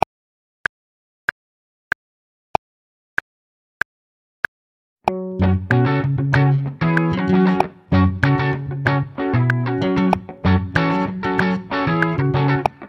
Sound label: Groove Improvisation Funk 8 Bar